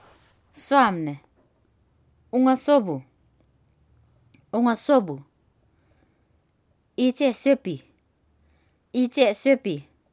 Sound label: sop peel